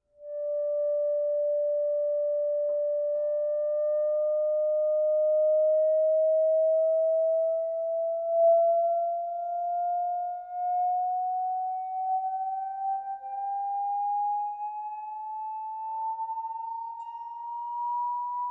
e-bow bottleneck, banjo

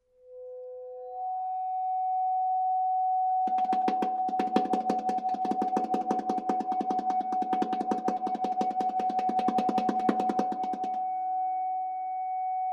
e-bow und klopfen, banjo

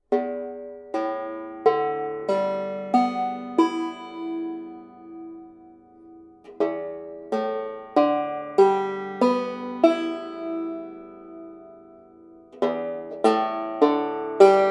leere saiten, banjo

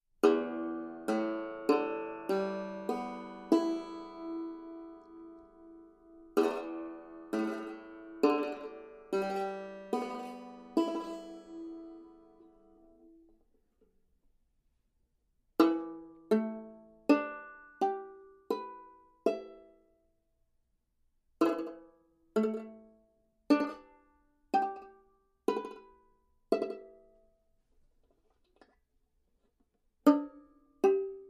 chop stick hackbrett, banjo

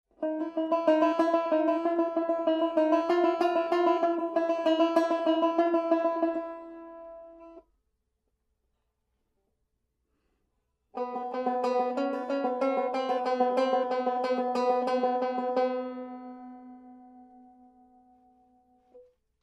viertelton melodien, banjo

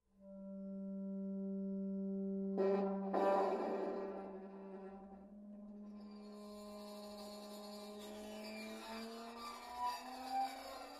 e-bow und kugel, banjo

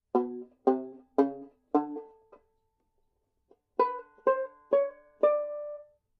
gedaempft, banjo

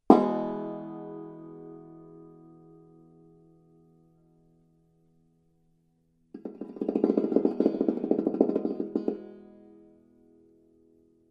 tambura e-dur, banjo

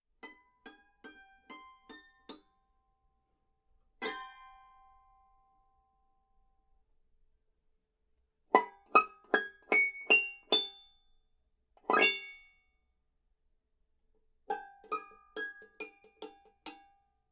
saitenhalter, banjo

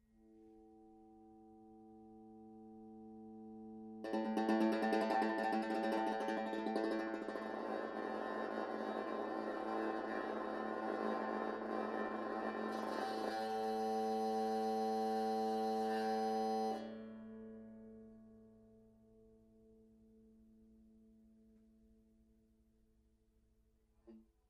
e-bow mit bleistift, banjo

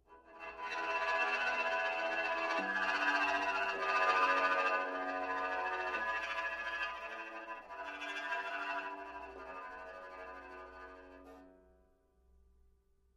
bogen, banjo

